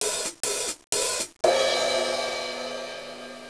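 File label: 3 small 1 big, drums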